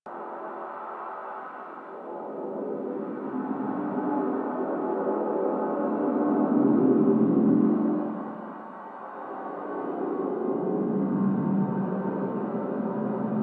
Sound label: Rail Jack Large Room Middle Ambience; Warframe